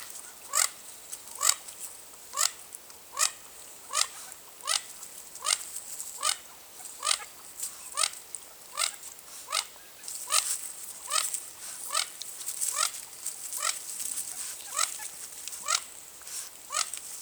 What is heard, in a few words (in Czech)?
kachnička mandarinská Aix galericulata